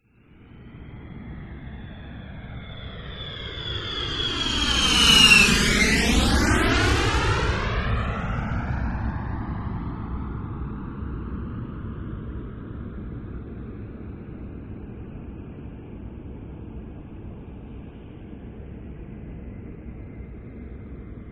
TRANSPORTATION JET MILITARY B-52 Stratofortress Flyby Close